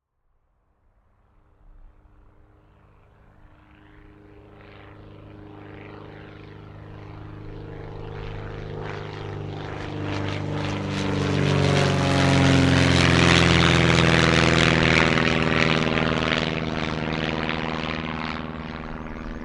transport aircraft airplane light prop pass overhead take off